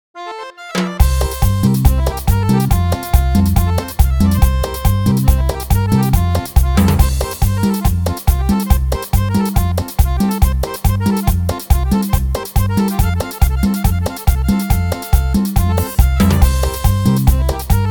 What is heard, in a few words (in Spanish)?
Cumbia